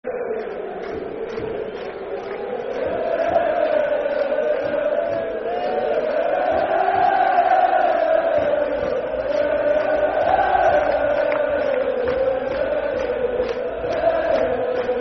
Football Chant (Soccer Song), N. Ireland